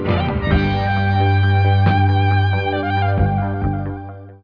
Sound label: music